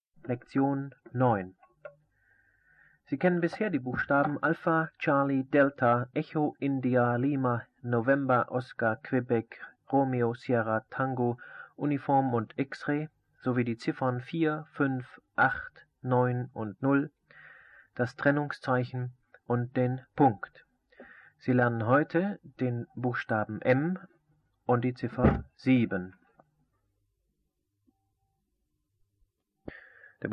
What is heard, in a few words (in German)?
Morsen